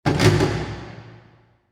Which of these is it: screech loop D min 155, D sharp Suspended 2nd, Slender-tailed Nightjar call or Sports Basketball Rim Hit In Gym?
Sports Basketball Rim Hit In Gym